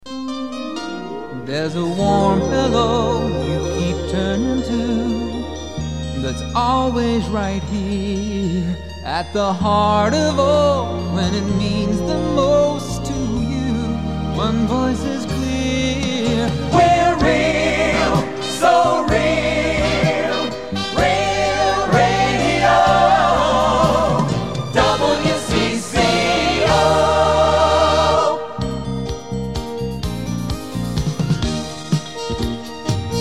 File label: Real Radio Jingle Full Vocal; WCCO AM